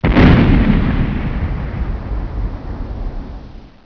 "Intro Thunder"; Final Frontier™

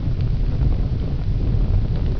BURNING; machines

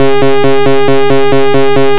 payoff; pvpoker

